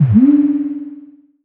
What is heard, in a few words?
future door open; soundeffect